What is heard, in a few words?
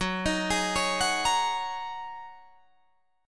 Gb 6th Add 9th, Guitar Drop D; chordshape; down